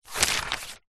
foley notepad page turn thin paper a4 push forceful finger